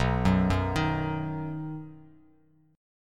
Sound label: B Major, Bass Standard; arpeggiated; 7,9,4,8; JGuitar